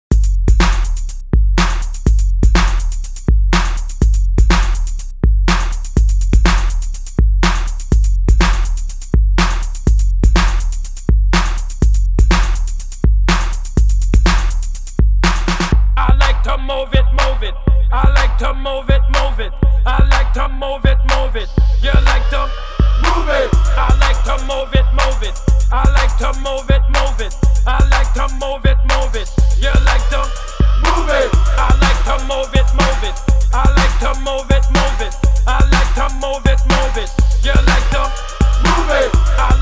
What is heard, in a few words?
bpm 123